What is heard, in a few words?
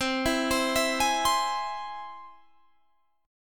C Augmented down Guitar Open G